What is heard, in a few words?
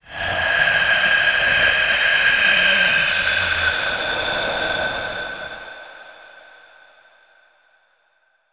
Take A Breath